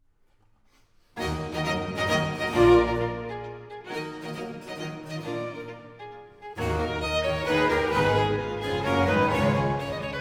violin, strings & continuo; E minor; Allegro; (doubtful), RV 274; Vivaldi; Concerti per Violino

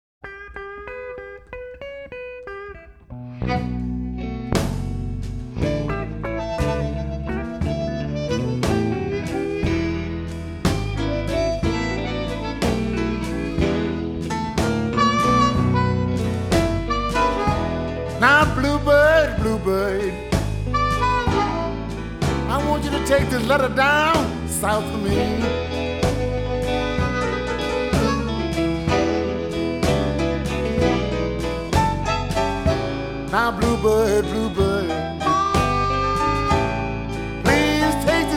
Blue Bird, song; Stereophile Test CD, Vol 3

